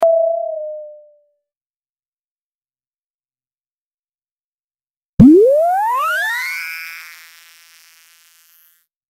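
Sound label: cartoon effect with bubble, charge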